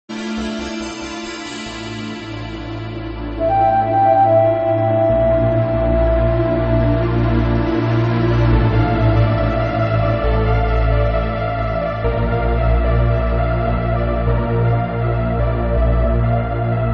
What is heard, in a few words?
Mental Trance Remix; Aztec Challenge